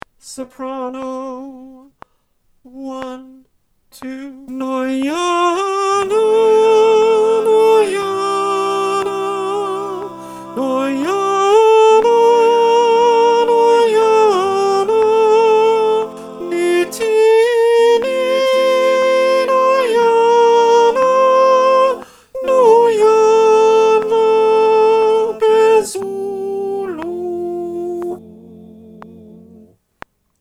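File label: Soprano Click track, choir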